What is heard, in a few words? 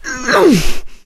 melee swing; source engine; left 4 dead 2; producer